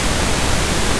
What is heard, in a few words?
noise pink